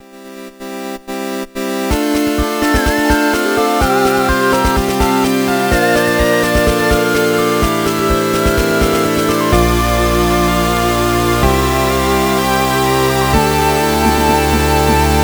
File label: game complete jingle